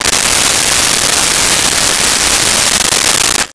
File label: Jig Saw; Tools